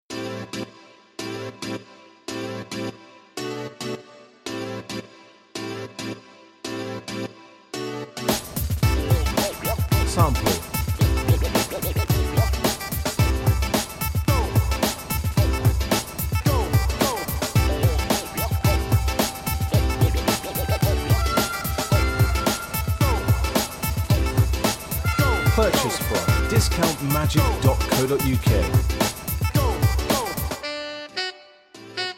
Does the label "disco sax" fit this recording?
Yes